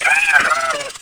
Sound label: dragon hurt